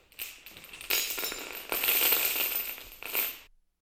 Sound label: chain rattle